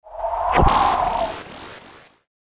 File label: Headwind